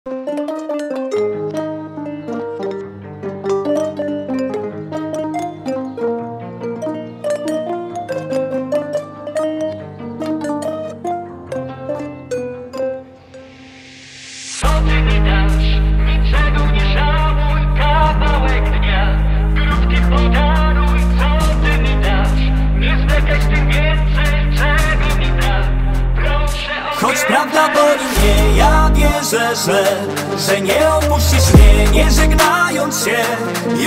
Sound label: drill